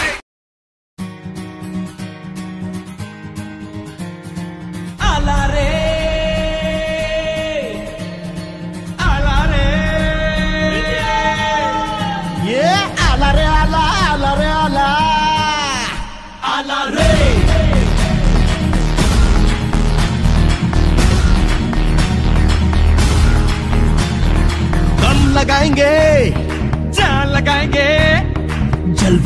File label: Team Song; IPL 2018; Mumbai Indian